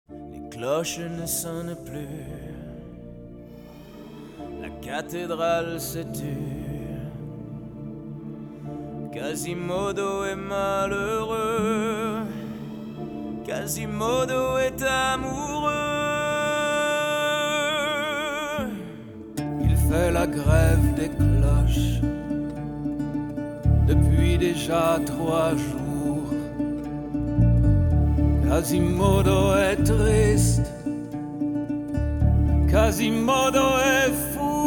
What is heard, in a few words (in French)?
Les Cloches; Notre-Dame de Paris